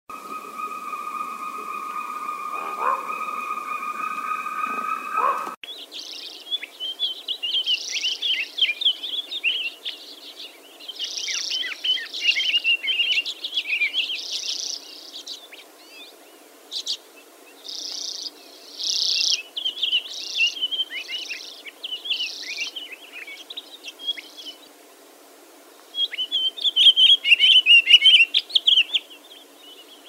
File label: Finnish Sounds; Hugh Harrop